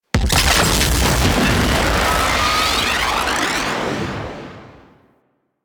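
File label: Tower Charge Explosion; Warframe; Corpus Gas City; Boss Arena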